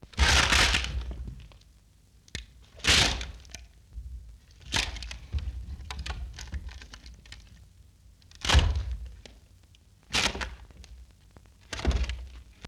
Wood Splinters

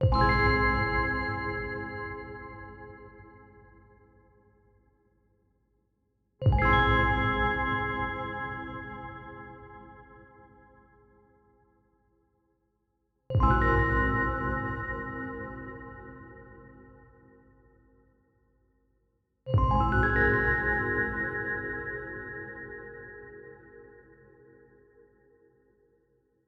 Magic Reveal

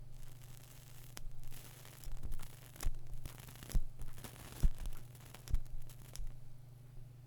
Lighting A Cigarette

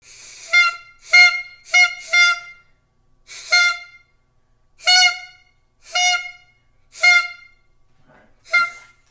Noisemaker

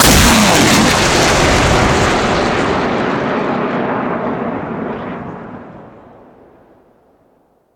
Grad BM 21 MLRS

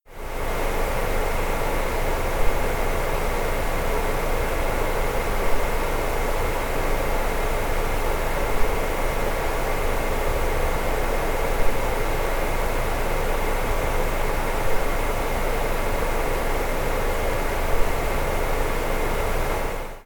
Computer Room

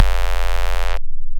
Distorted Bass

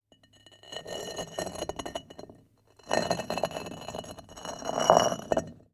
Stone On Stone Dragging

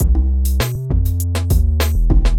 Hip Hop

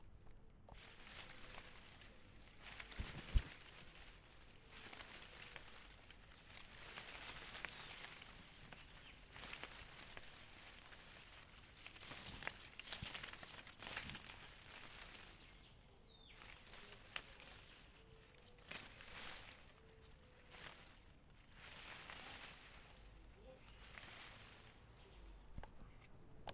Leaves Shaking

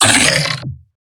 Bite Growl

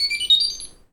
Washing Machine Beep

